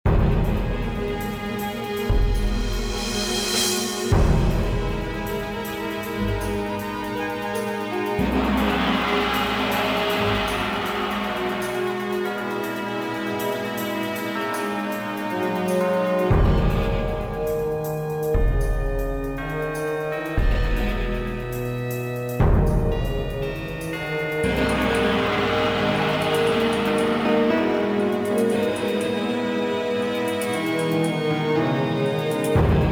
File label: fast, music; Soldier Of Fortune II Double Helix; XBOX; prague